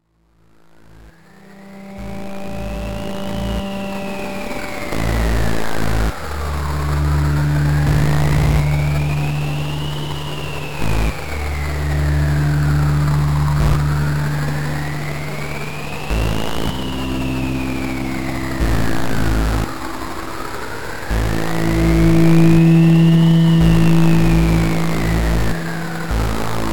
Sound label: saw in the wind